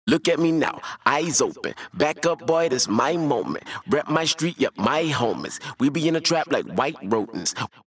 Vocal Harmony Off

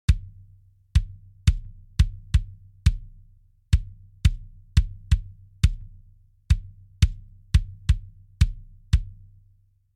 Kick EQ On; MannyM

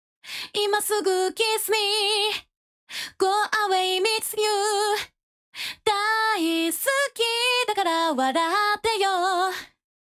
reverb Off

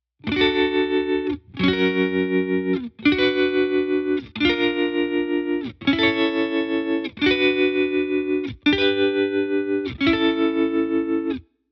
guitar on

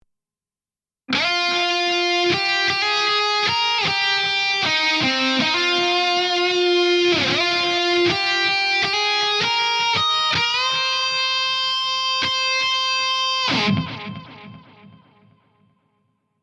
phaze off

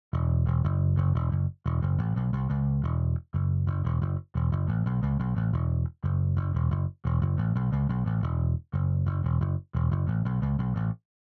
bass off

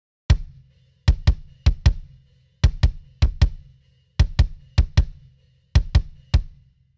Distortion Kick On; MannyM

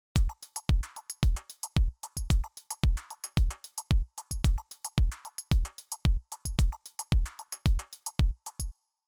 kick off

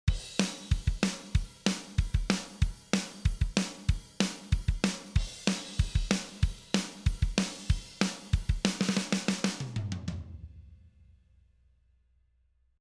drum Comp Off

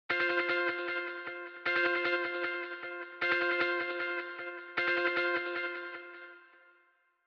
delay on

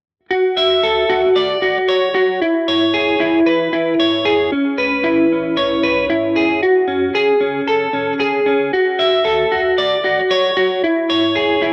clean Effect On

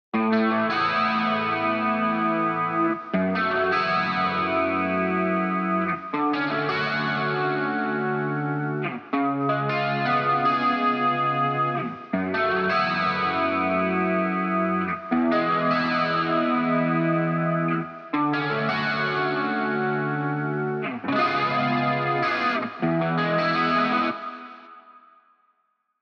guitar on

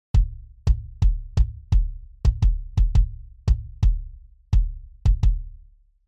kick off

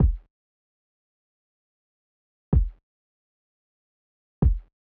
kick on

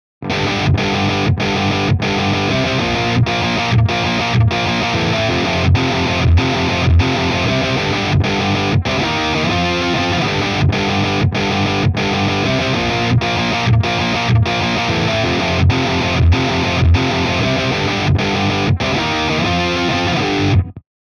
eq Off

